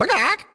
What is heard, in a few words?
Cluck cluck; lego my style preschool